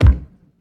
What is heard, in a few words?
tight lows kick single hit c key